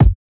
Kick Hit

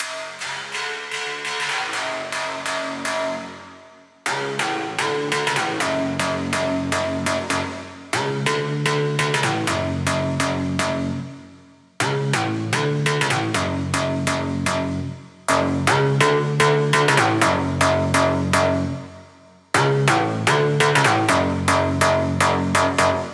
Bass WET, 124 BPM A; Big EDM; Future House Tools; Construction Kits; Power

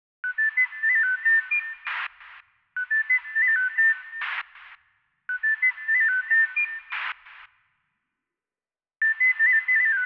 Flute, 095 Bmin; Hip Hop; Hip Hop Exotica; Apple Loops; Big Fish Audio

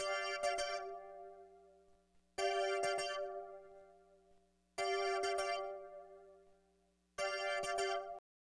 Organ, Hip Hop, 100, G

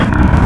Pig, Animals Wavetables; Cymatics; Serum; Animals for Serum - Gold Edition

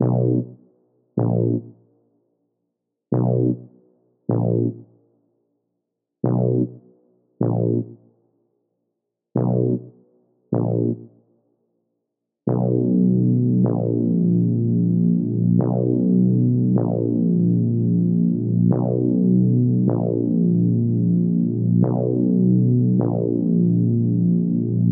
77 BPM B Min Bass; Cymatics; Destiny Gold Expansion; Light It Up

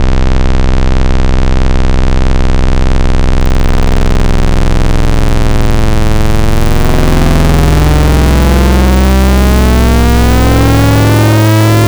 Hive, Wavetables; Cymatics; Wavetables Collection Vol 3; Serum

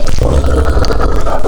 Dog, Animals Wavetables; Cymatics; Serum; Animals for Serum - Gold Edition

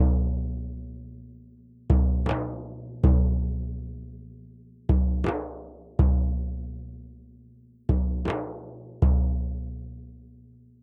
duff 080, Hip Hop India 080bpm; Hip Hop India Modern Percussion

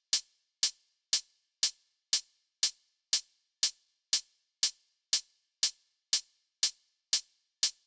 Big EDM Drum Loop Hat loop, 120 BPM; Future House; Future House Tools; Big EDM Drum Loops

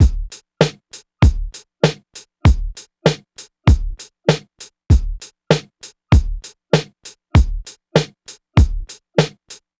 drum loop 098, Hip Hop India, 098bpm; Hip Hop India Modern Percussion; Apple Loops

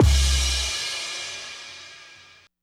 Crash, 095 Emin, Hip Hop

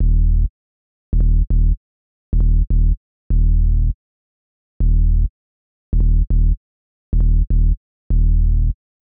Bass

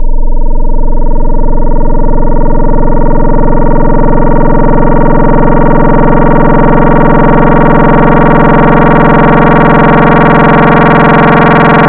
FM Table, FM Wavetables; Cymatics; SERUM

